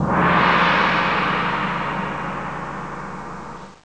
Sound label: GONG